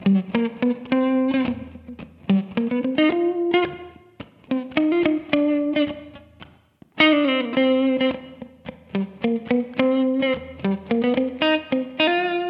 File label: Advance guitar solo